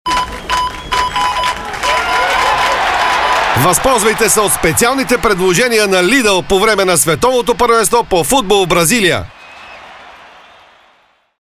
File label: GONG